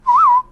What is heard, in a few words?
Bird